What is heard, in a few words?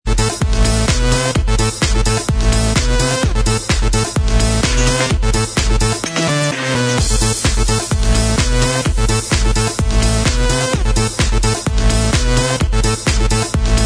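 remix Instrumental Track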